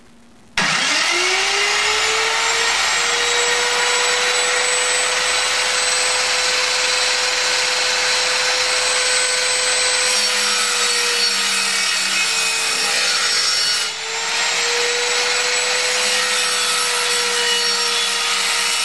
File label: sawblade